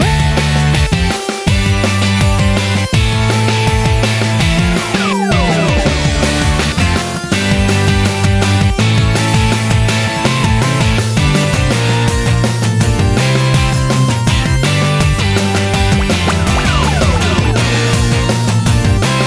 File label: Poprock Boucle; Imagine Modern Dancer; Nintendo DS